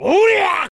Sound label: Shout; Ganondorf